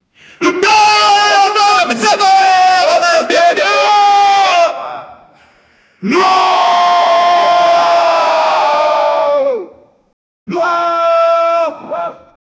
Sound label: a knight shouts in pain; battle-cry